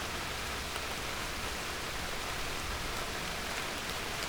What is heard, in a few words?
rain; weather